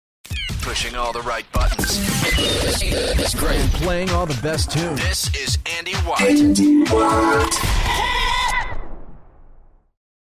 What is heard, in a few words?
jingle; pushing all the right buttons